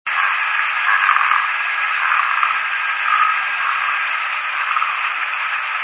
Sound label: egret great, Great Egret